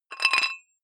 dumbbell thread take off weight quick, fitnessstudio